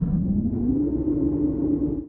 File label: spaceship lift up